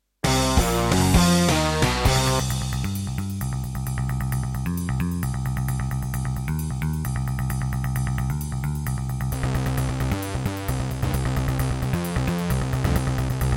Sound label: Roland SC-88 Pro 88MAP; sigil doom episode 5